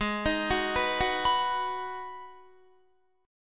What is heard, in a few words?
Ab Minor 7th down Guitar; Abm7; arpeggiated